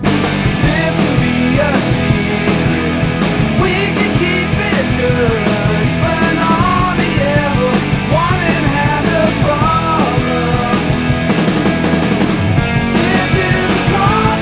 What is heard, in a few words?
Foo Opening loop